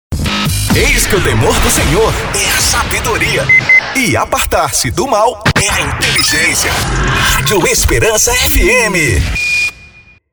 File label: vinheta gospel